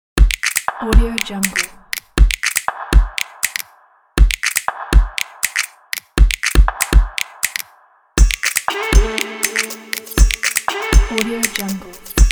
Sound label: snap clap energy